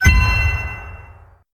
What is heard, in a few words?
Treasure Picked; Delay No More